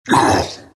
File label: morph pain; terrorblade